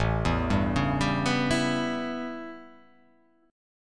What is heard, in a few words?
A 9th, down